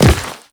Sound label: Step Rock, Footsteps; Warframe; The New War; Characters; Kahl